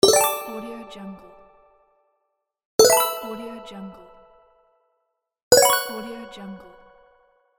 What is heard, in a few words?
small complete; game sounds; کامل شدن مرحله به مراحله